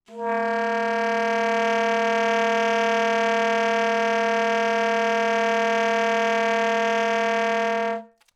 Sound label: alto sax multi; OM-SoX hoaencode